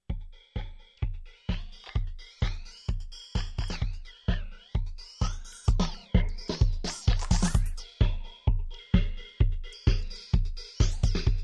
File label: drums; k3m mod